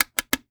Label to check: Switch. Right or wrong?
Right